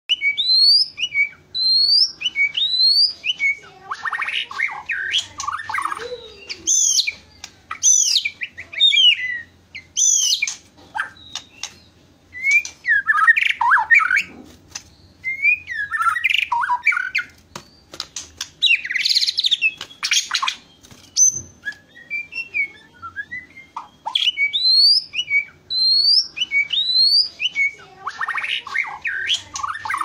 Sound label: White rumped shama